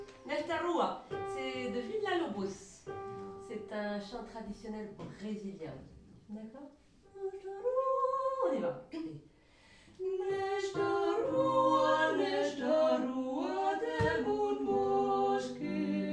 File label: Cabaret